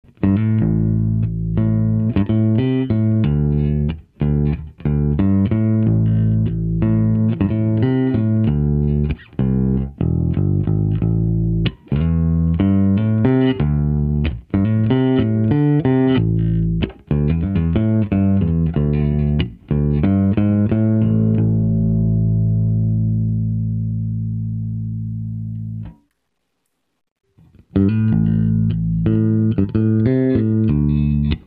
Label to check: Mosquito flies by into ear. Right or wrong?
Wrong